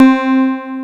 PPG, MICROWAVE; East West - Global Sounds

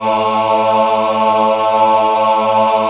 Choir Acoustic & Synth, MENWO AH; USB Soundscan vol.28; AKAI

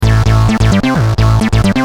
Driven, Bass; Industrial

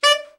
ALT SRT, ALTSAX SHORT; Giga Samples Collection

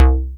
MEGA BASS C4; Best Service Dream Experience

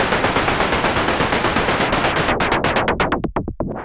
SH101 Clocked LFO Rhythm (125BPM)

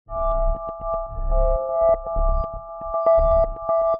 Synth loop (120BPM); musicradar

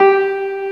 PIANO G3

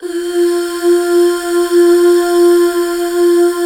UUHH F4, CH BACK; Best Service Gigapack 1

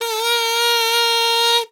KAZOO; Xsample VOL-9

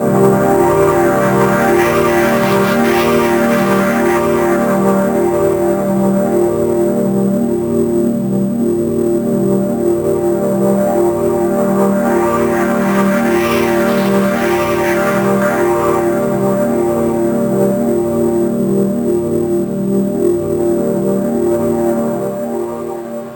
pad 8khz; cyber bunny; M8; M8JUNGLEPAK1

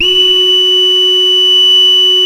beginloop, synth; Keith Emerson Signature Series; BEGINNING

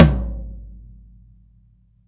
TOM D, Drum Dry; East Collexion; Drum 1

